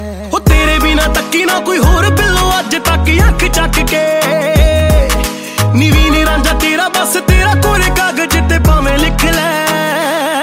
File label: Punjabi ringtones